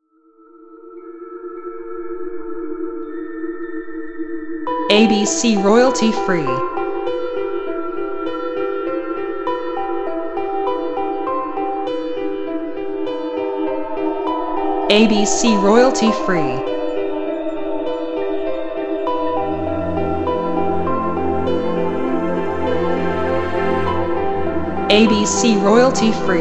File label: Ambient Halloween